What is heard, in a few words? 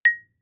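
Marimba yarn fortissimo Bb2; MIS Pitches - 2014; Percussion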